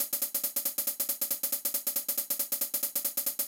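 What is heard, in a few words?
16TH hi-hat, Techno 138 BPM; Best Service ProSamples vol.54; AKAI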